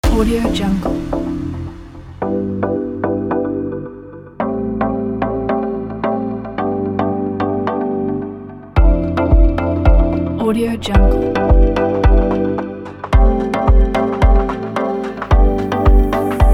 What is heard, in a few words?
tropical deep house kit